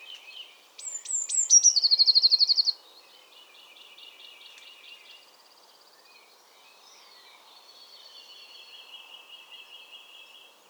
mésange bleue chant

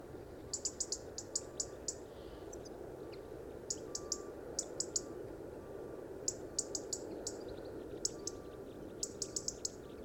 rougegorge familier cri

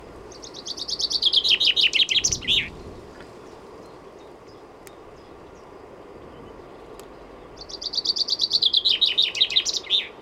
pinson des arbres chant

